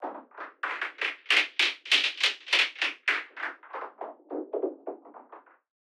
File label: Movement; 99Sounds; InterSpace